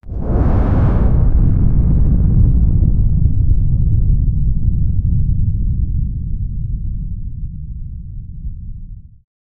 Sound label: deep earthquake